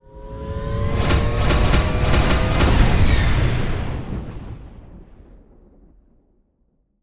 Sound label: warp rings; Earth and Beyond; EA Games